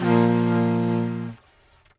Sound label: Sheer Trumpet A#2